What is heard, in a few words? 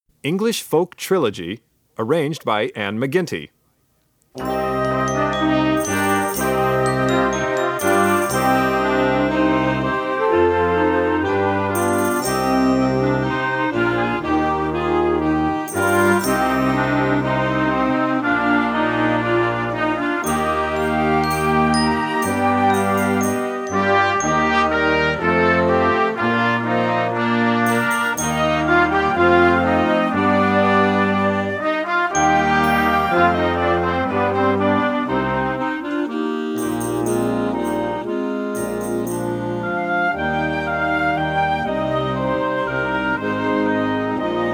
English Folk Trilogy